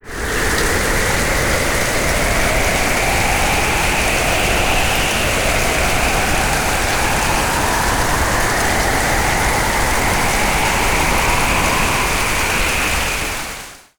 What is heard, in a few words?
wind and rain